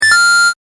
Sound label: correct; 正解の音・ピンポーン